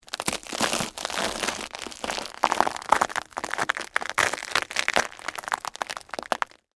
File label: Texture, Crunch, Footstep, Grind, On, Ramen, Noodles; Crunch Sound Effects